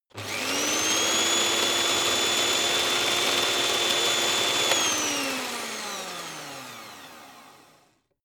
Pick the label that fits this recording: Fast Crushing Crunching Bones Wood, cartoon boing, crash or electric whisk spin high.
electric whisk spin high